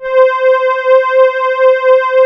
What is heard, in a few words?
Poly800 C3, Korg; Back In Time Records; Korg Universe VOL-1